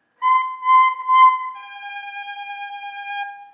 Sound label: Four Notes, Violin; Cheryl Violin Project